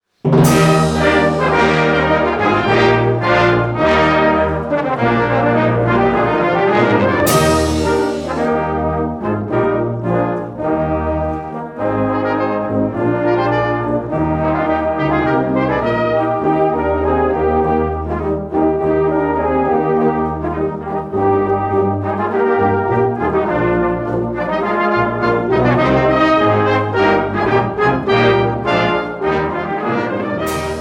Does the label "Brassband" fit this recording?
Yes